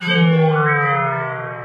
ball slow; eiffelmedia; brick breaker

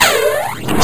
destroy all; eiffelmedia; brick breaker

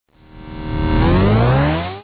drive right; eiffelmedia; brick breaker

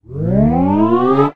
bar fast; eiffelmedia; brick breaker

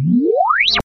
bar large; eiffelmedia; brick breaker

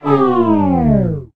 bar slow; eiffelmedia; brick breaker

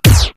disappear; eiffelmedia; brick breaker

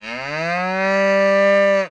good item; eiffelmedia; brick breaker

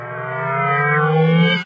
ball fast; eiffelmedia; brick breaker

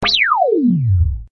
bar small; eiffelmedia; brick breaker

